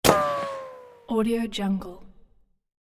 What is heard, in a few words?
wild west bullet ricochet; hollywoodedge